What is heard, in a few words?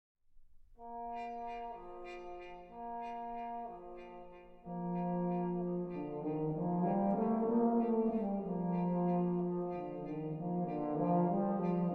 Brass; Gadfly Suite